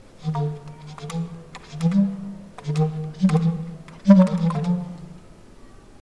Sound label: Cirrus Light Muttering